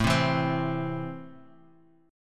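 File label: A Minor, Guitar Half Step Down, chord strum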